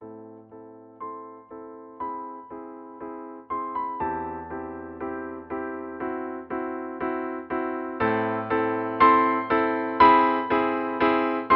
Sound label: Mono EQ